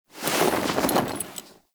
Camp Canopy Flap; Quests; Veilbreaker; Kahl Camp

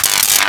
Ocean Electric Surge Small; Warframe; Grineer Ocean

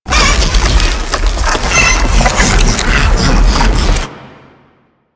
ape prelate escaping loop; EndlessCapture

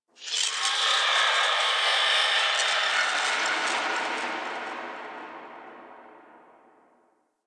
Sentient Lair Response Large, Ambience

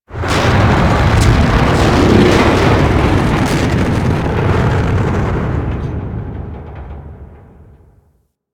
Star Base Large Rumble; Warframe; Ambience; Star Base Intro

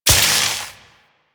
Vial Shock Shatter; Warframe; Alchemist

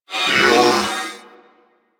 Purgatory Warrior Charge Scream, Barks; Warframe

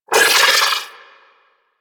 Sentient Battalyst Vocal Pain; Warframe; Sentients

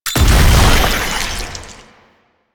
Proto Infested Maggot Explosion

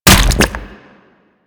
Goo Gun Sack Land Large

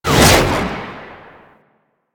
Jet Turbine Pistol Secondary Shot Release; Warframe; Tenno

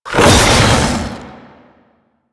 Flame Coil Ability Shot; Warframe; The New War; Archon; Amar; Abilities

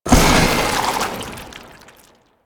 Tendril Grasp Release; Warframe; Broken Frame

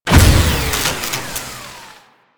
Energy Ribbon Shutdown; Warframe; Veilbreaker; Quests; Kahl

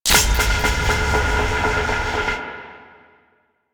Cave Figurine Assembly; Duviri; Teshin

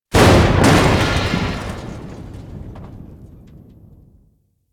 Destruction Explosions One; Ambience; Warframe; Corpus Railjack

